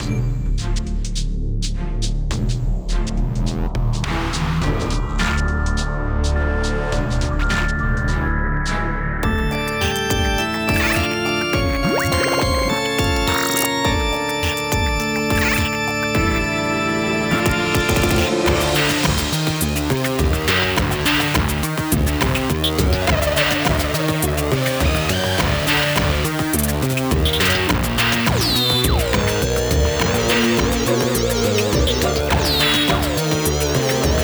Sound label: Music Eclipse